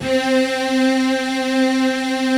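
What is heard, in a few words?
PAD, atmospheric pads; Best Service; XX Large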